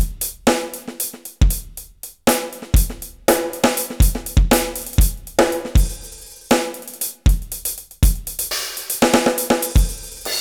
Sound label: spin beat 120